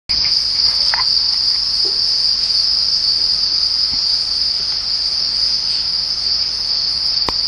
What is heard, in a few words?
Crickets